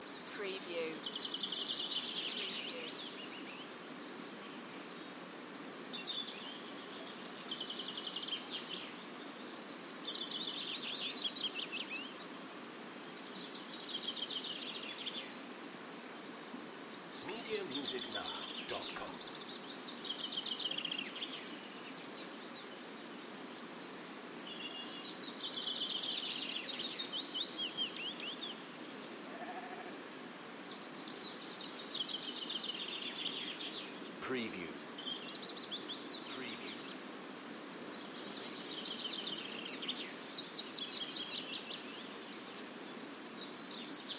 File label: Nature Ambience Cym Bychan Wales Loop; beanstalk audio